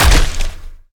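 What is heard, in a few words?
prick, impact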